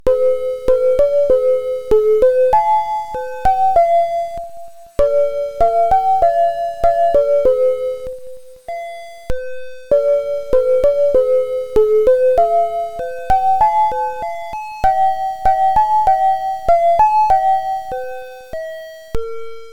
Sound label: sine tri